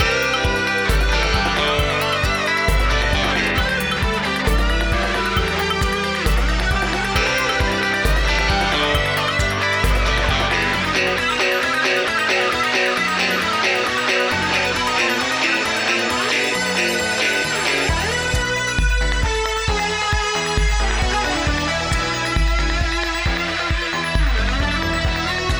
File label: solo ontempo 134bpm